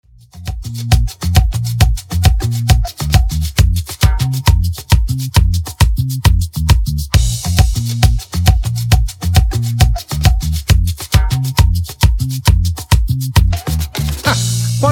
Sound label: intro outro 135 bpm